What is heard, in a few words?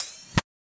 LOOP PC, Drum & Bass, 160 BPM; Ueberschall; Drum & Bass Flash Trackz